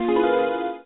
Credit SFX; The Age of Heroes: Silkroad 2

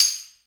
Tambourine; Squid Beatz

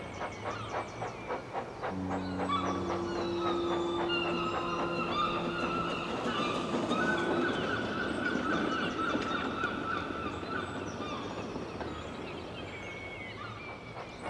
Amb Ticket Office; thomas friends railway adventures

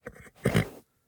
horse grunt; teardown; ps5 windows xbox series xs

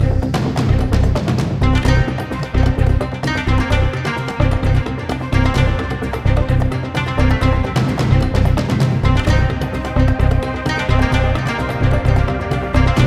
Bgm Volcanotemple Fast

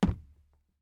plastic land; teardown ps5 windows xbox series xs gamerip 2022

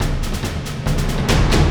Loop Start; Shuriken and Aliens

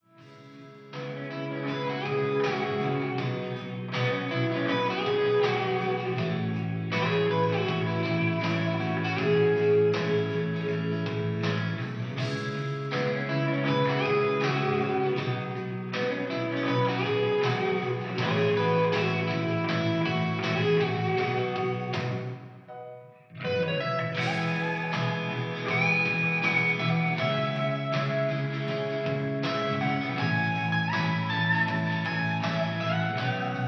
Rock; Fist of the North Star: Lost Paradise; Hokuto ga Gotoku; PlayStation 4; Usen Rock

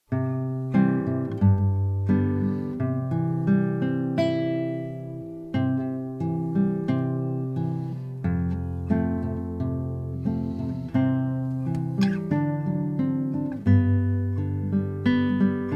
Guitar; assassin s creed syndicate

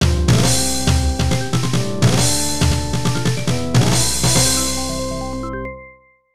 Stage Clear; The Age of Heroes: Silkroad 2

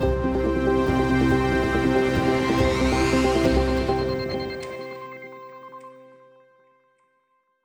Player Victory End

